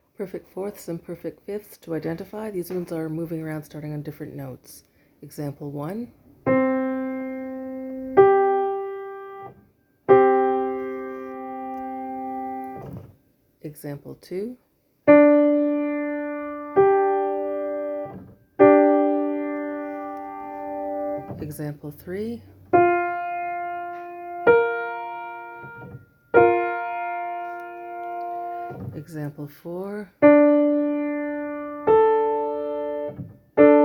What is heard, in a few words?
P4 and P5 varying starting notes; Interval Identification